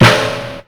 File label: Snare, Ensoniq ASR-X Pro